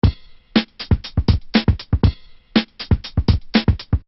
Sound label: dry loop